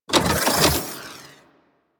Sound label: Umbilical Machine Arms Engage; Warframe; Liset; Hub; Machines